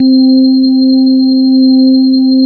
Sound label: HX 1 ORGAN; KEYS; Alpine Volksmusik